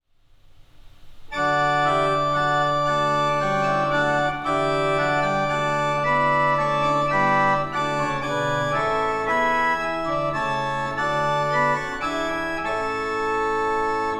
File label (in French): clavier Gemshorn 8 Rohrflote 4 et Montre 2